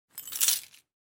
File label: Keys Pick Up; clown house palya o evi